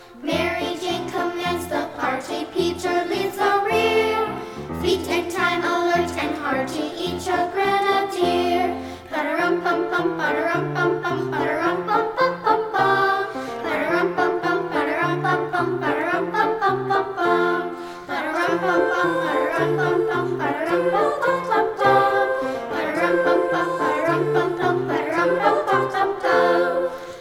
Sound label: Marching Song, choral; crooked river choral project vol 2